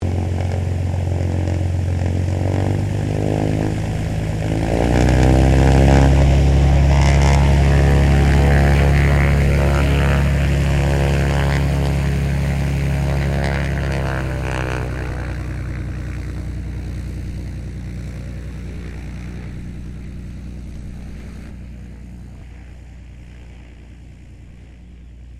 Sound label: AIRCRAFT PIPER CHEROKEE 6-RG TAXIING AWAY; Light Civil aircraft; Airplanes; Vehicles